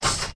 switch wiper, Train cl158; OpenBVE

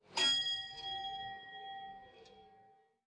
Ding, train; OpenBVE

